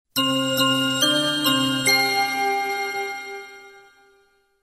Bells; Rad Sunshine

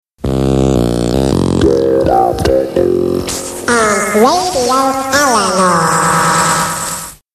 Jingles

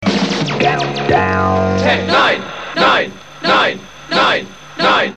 Countdown Jingles

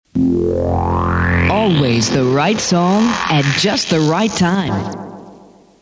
80s Song

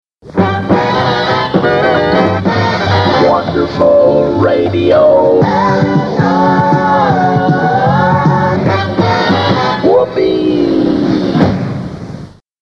Jingles

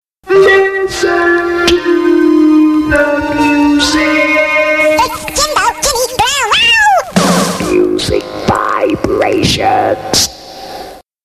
Jingles

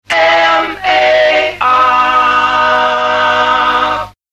M A R (sung); MAR Jack

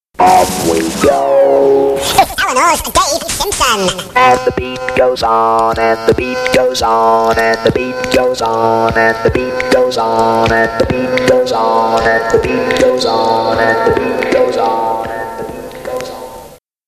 Jingles

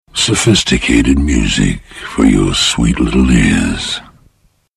sophisticated music

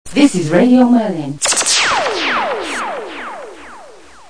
jingle; RMI

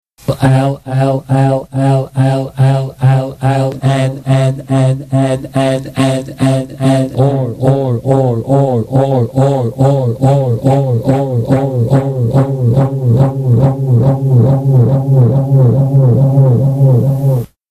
Jingles

